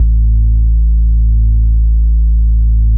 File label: A2, Bass; E-MU Producer Series Vol. 6 – Analogia Project (CD 1); Boom da Bass 3